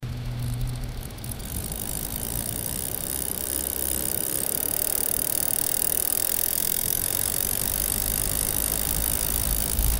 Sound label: sound of a roadbike